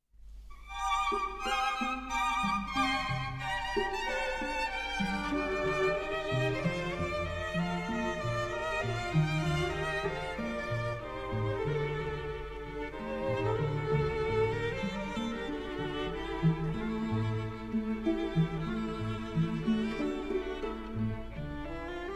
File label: String Sextet No. 2 in G Major; Op. 36; Brahms